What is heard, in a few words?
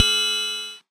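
Concertmate-670 Kit Triangle, One Shot; Realistic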